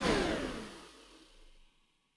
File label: Aircrafts Bf-109K-4 Fuel Pump End; DCS